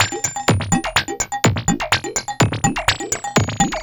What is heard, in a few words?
perc 125 bleeps, Percussive Loops 125 bpm; bleepsville